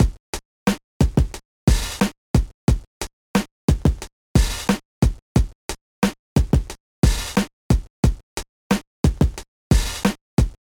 HipHop; Pinky and the Brain The Master Plan; GBA

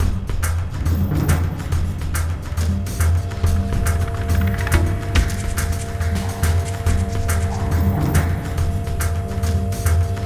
Robot Loop; Curse of Atlantis: Thorgal's Quest

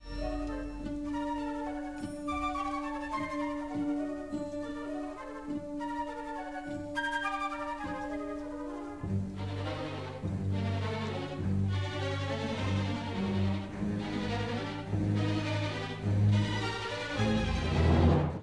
LOOP selection; DW How to Train Your Dragon

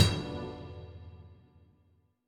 stings leveldown; Armello The Bandit Clan

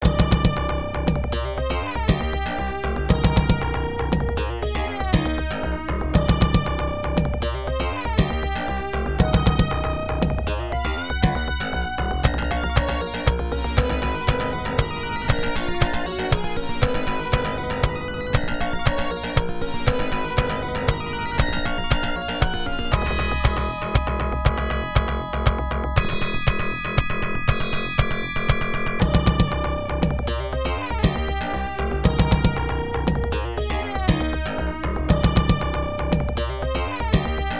Dungeon; Providence; pc-8801

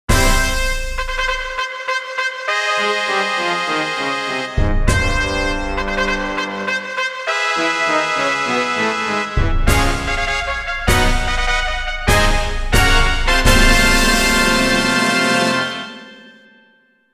Chukyo Grade Race Fanfare; Kokura; Derby Owners Club Perfect Audio Collection History of DOCDOC Online

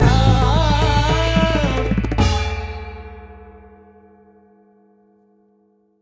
Transition; Feastival of Lights; Cooking Dash

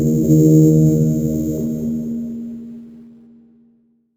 Retry Jingle; Friday Night Funkin Daves Halloween Bash; Intervention

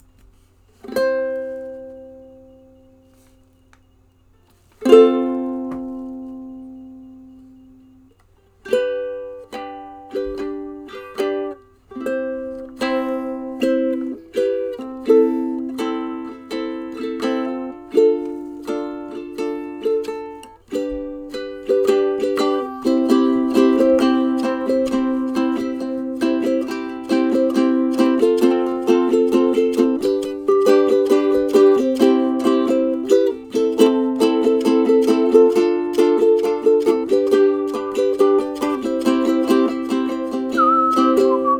Uke-coustic; timmy turners grand sun 2023

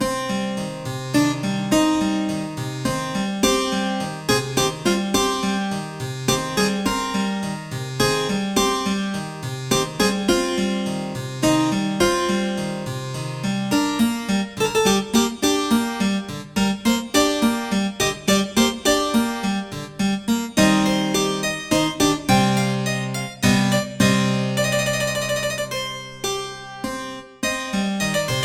Dungeon Cellar; ashes of the night; Dungeon - Count Crowleys Cellar

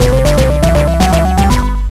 Unused Jingle; Zapper: One Wicked Cricket; GBA

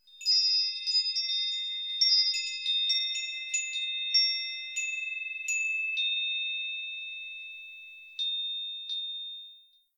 Chimes tiny

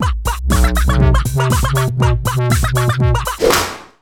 bgm pumpkins; Slayaway Camp: Butcher's Cut; PS Vita

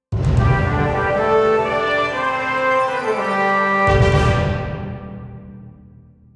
Orchestral Fanfare Short

